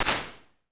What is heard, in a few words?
Small Wood Chamber; Ambience and Small Rooms; Ableton; Convolution Reverb